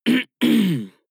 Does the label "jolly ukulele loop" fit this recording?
No